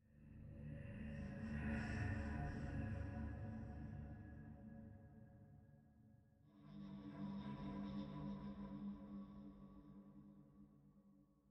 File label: Ghost Pad